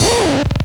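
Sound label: RECORD FX scratch; XP RECORD-FX; Best Service; XXL Production Effects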